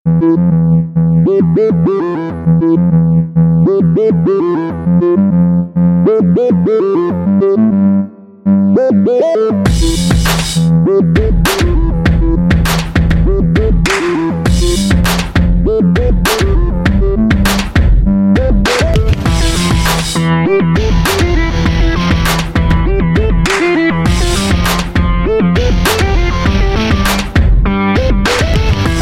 beats, Albanian

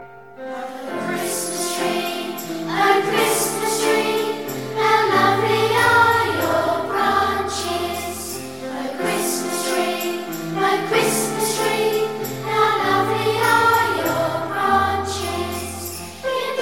O Christmas Tree

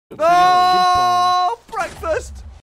Ahh Breakfast Alarm

